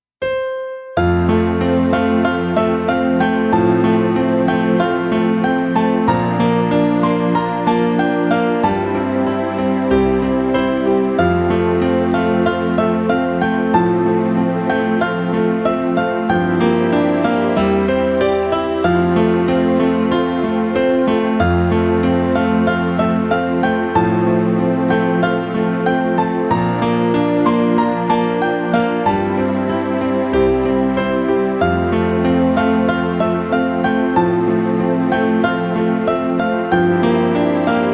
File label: piano